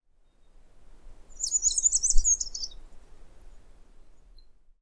diglossa plumbea, Aves, Chirripo; Paramos; historia natural; Galeria biodiversidad

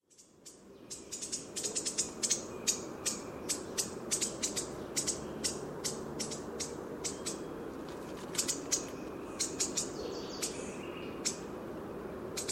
cantorchilus modestus, aves; historia natural; galeria biodiversidad

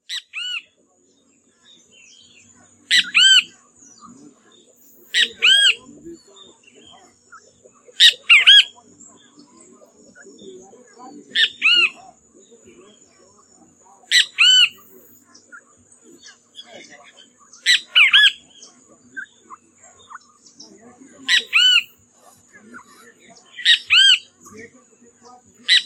pitangus sulphuratus, Aves; historia natural; galeria biodiversidad

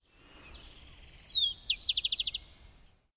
zonotrichia capensis, aves; historia natural; galeria biodiversidad

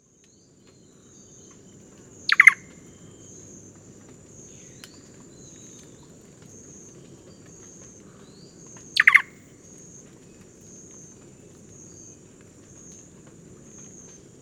cantorchilus nigricapillus, Aves; Gandoca-Manzanillo; historia natural; Galería Biodiversidad

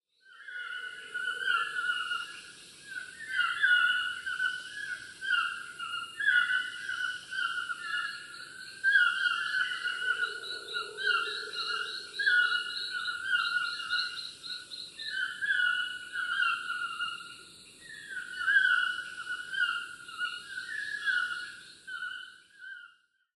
ramphastos ambiguus, Aves; Gandoca-Manzanillo; historia natural; Galería Biodiversidad

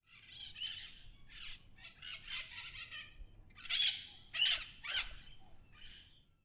psittacara finschi, Aves; historia natural; galeria biodiversidad

